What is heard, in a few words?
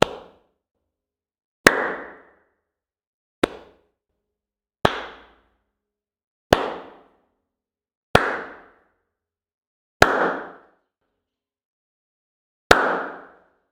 claps; NS Studios - ultra sound collection; human